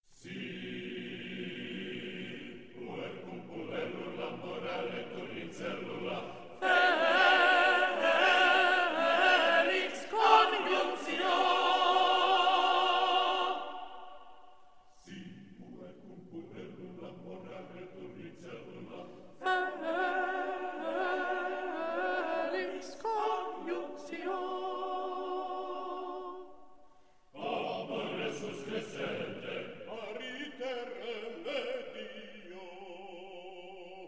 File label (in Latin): Si puer cum puellula Tenor slow